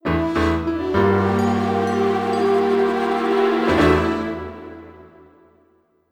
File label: (Jingle) Death; Gnasty Gnorc's Cave